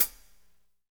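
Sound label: hi-hat, Pop Country, Wet; Northstar; Drumscapes Roland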